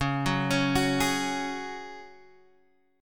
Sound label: C sharp Suspended 4th C sharp, down, Guitar, Half Step Down